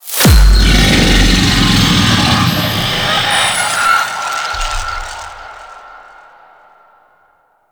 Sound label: Sentient Oplink Murex Disruption; Squad Link